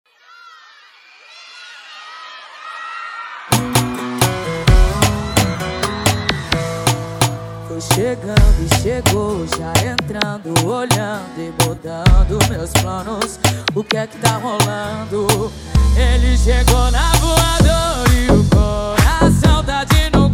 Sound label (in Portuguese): especial sertanejo